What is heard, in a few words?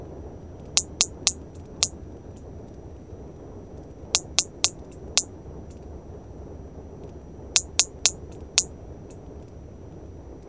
Sperm Whale